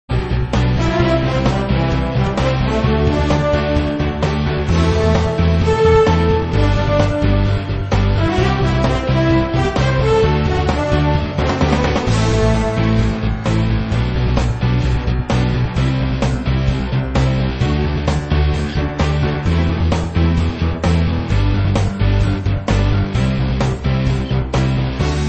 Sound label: Hybrid Orchestra Remix; Bombo